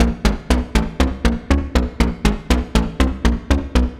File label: Chaff Perc 120bpm; horrorscape